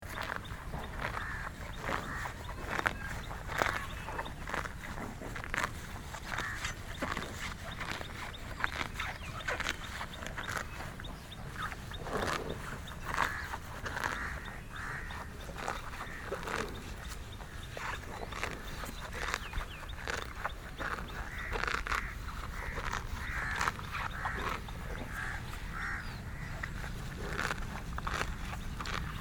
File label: puffalo grazing, nepal